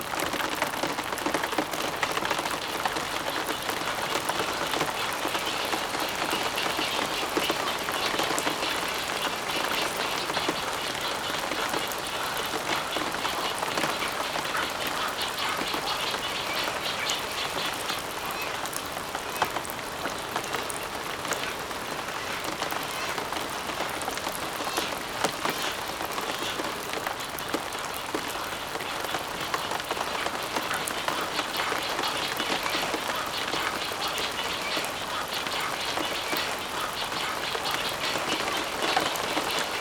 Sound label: Frogs in a Cr; Natural Nature Makers; Frogs in a Creek